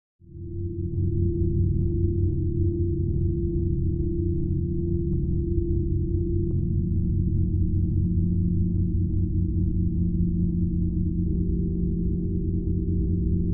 Space Ship Cntrl Rm

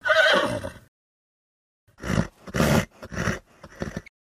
Horse Whinny Excite; milling vocals

